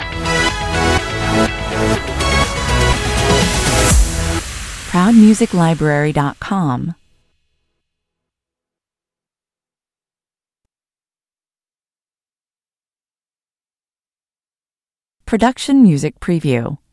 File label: (stinger)